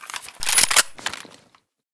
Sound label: svd misfire, weapons